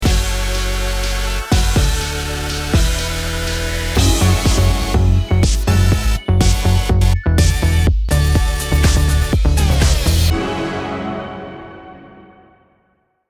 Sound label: Jingle; IDENTITÉ SONORE; NOUJOUM EL BELDI